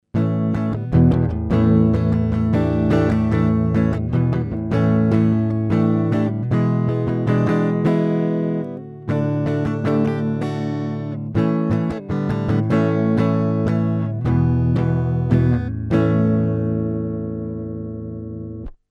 Mackie DLZ Creator E Gtr clean

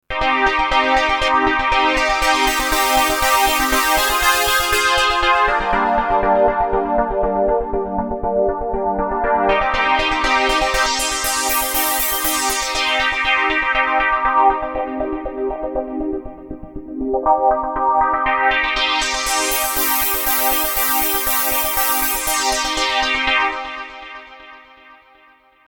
V-Synth Analog

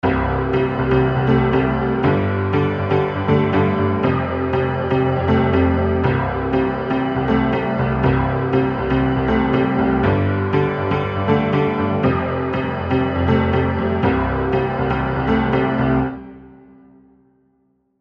EZkeys Grand Piano Synth Pop Horror Score

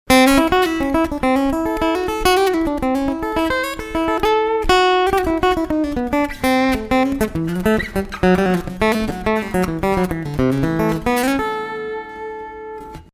TL 6 Highboost Countryblues Picking; ESP LTD

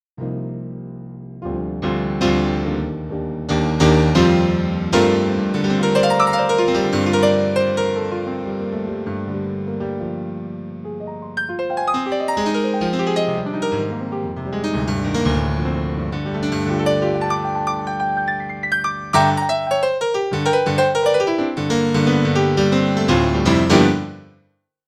Roland Pia

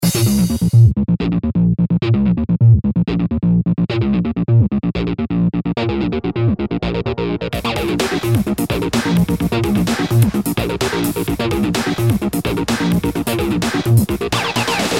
Bassliner

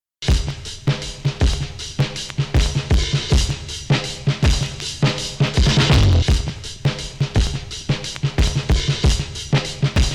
Timestretch Trick

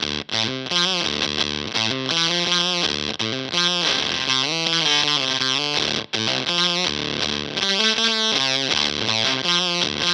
JHS Cheese Ball Mode 2

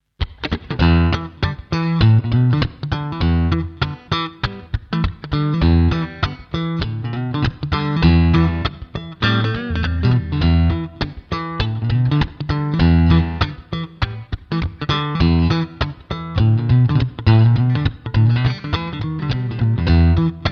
Framus Diablo

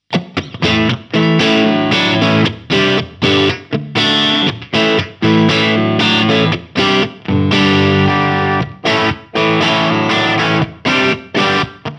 Sound City Master One Hundred Single Coil Normal

